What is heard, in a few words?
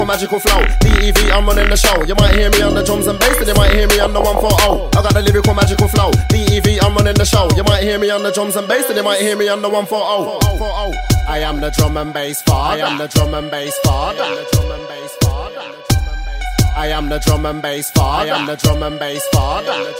drum and bass; father